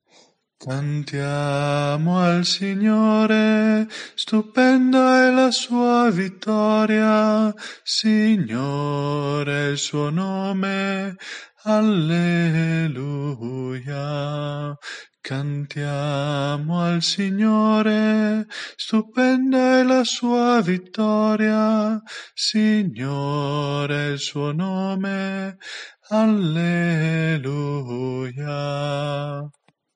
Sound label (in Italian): il canto del mare ritornello voce principale